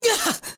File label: Damage